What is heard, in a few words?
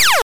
Laser